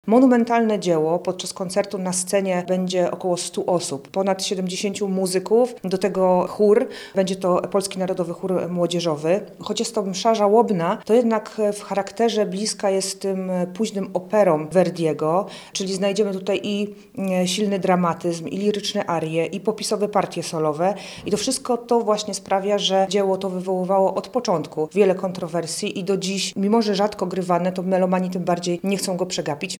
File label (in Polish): koncert oratoryjny